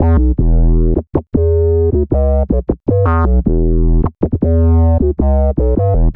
Synth Bass

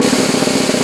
Piccolo Snare Roll; Digital Sound Factory Virtuoso

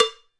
PRC CB, PERCUSSION; Sonic Reality; Interactive Drum Kits

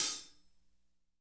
hat slosh

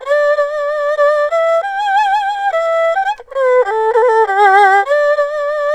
ERHU; INIS; Opium

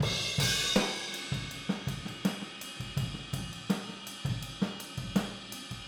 Boogaloo Chorus Overhead ST, 82 BPM

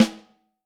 Vintage Snare Drum

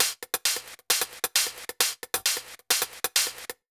LOOP, Ragga & Dub Reggae; USB Soundscan vol.27; AKAI; DANCE

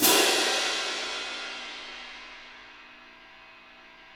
Cym Crs; Digital Sound Factory Virtuoso

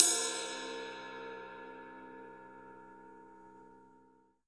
RAW RIDE, Drums Acoustic; ALL RIDE; USB Soundscan vol.10; AKAI; 90s sample CD

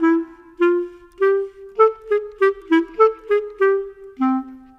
Clarinet, Orchestral; Modern; Sonic Foundry

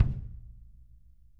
kick, drum kits

